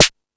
FX Sounds, Drum Machines

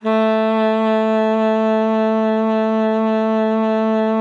A3, alto sax; Xsample VOL-2

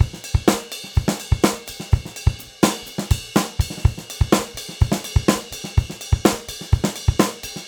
125, Beat Loops; Carnage; Zero-G - Monster Beats

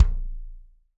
BD DRY, Drums; Best Service; Real Mega Drums

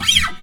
Guitar Scrape; Proteus 2000; Digital Sound Factory Proteus 2000

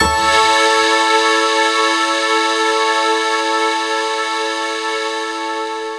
Dream Pad (Maj)

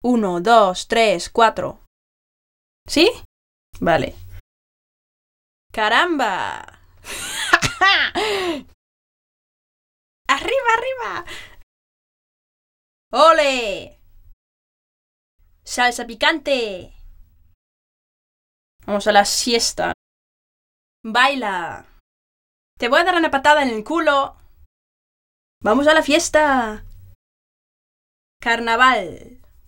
Salsa Picante; Masterbits; World Zone VOL-3

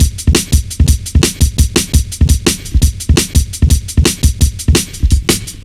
170, Drum Loops, Drum & Bass

